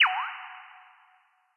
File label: water